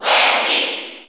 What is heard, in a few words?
Cheer English; Wendy; SSBU